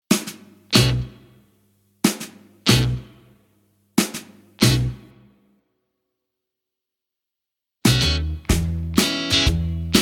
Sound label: E No Backing Vocals